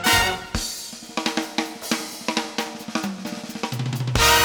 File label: Fill; DARKMIND